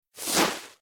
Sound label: arrowstorm cast; abilities